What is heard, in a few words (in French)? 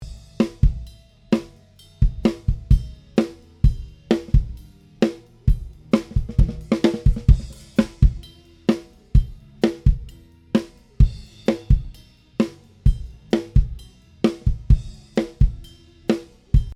grosse caisse et caisse claire dessus seul, sans effet ni EQ; tout nu comme je les ai pris; D6; batterie